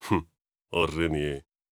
laugh

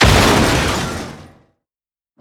Car Damage Large; gamerip; Car Simulator 2; android

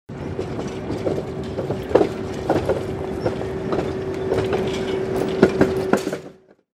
subway animation

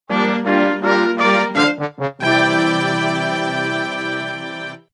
Goal; Mario Kart 8 Deluxe; Booster Course Pass; (Yoshi's Island)

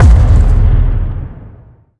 Footstep

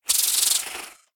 Spider Aggro; The Inn

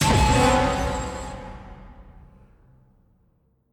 Mus Multi Jingle; Chronicles of Riddick Assault on Dark Athena

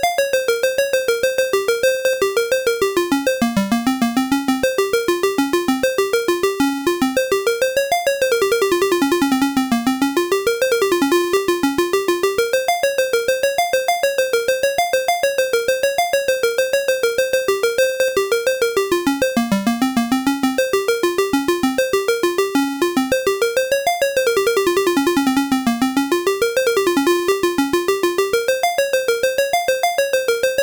Level BGM; Ninja Princess; sg-1000

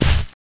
Ball Sounds; pangya fantasy golf

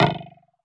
hollow

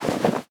Tnt Box Parachute Close; angry birds rio

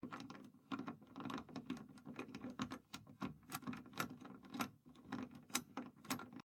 Lockpick Sound

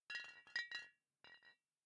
Bottle Shake; The Town

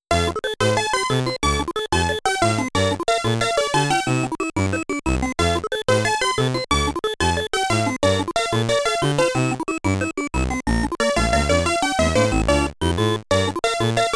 Bonus Round Music; Mappy

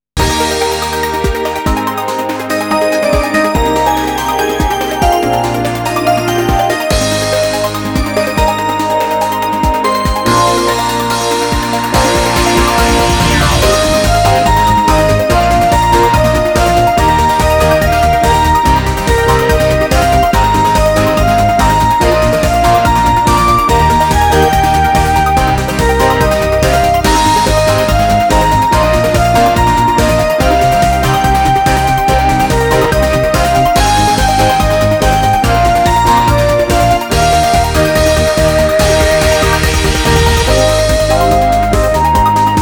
Growing-instrumental ver

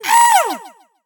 Cheerleader pain

